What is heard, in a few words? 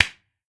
clap; smite; gilgamesh